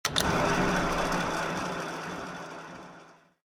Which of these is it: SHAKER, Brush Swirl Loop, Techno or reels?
reels